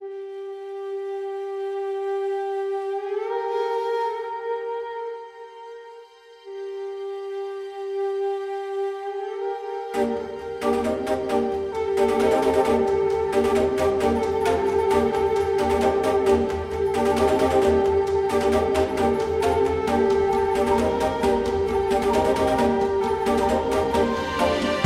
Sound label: Mazurka